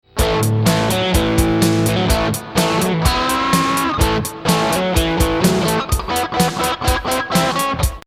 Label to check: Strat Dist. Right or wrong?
Right